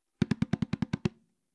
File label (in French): frise, congas